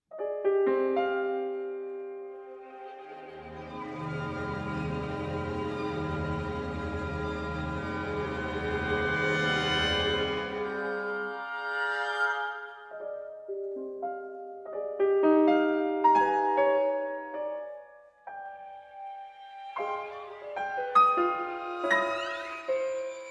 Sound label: piano concerto; akikos piano